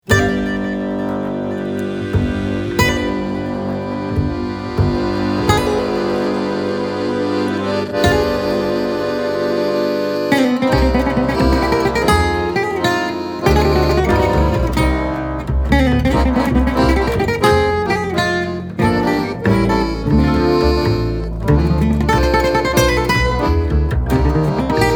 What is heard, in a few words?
waltz